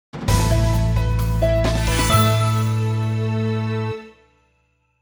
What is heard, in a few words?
jingle success